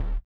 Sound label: BUZZER; Spectrasonics; Metamorphosis